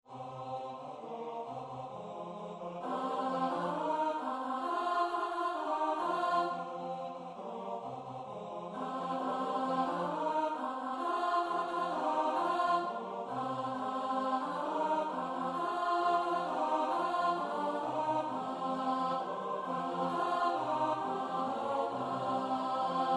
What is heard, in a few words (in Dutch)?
dese coxkens alt midi; ons musyckboexken 2016